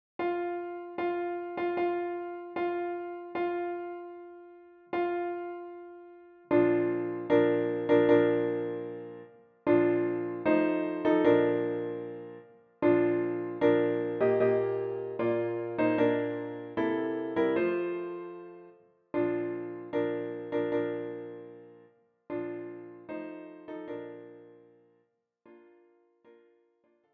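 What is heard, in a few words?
piano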